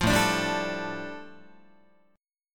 A 7th Suspended 4th Sharp 5th A, Guitar

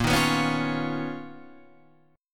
B Major Sharp 11th A sharp

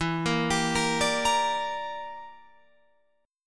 E Minor 7th Flat 5th, Guitar, Open F; Em7b5; arpeggiated; 16,17,19,17,17,17

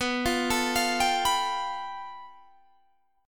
A 7th Suspended 2nd Sharp 5th

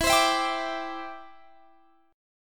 E Minor 7th Sharp 5th, down, Guitar, Standard; strummed

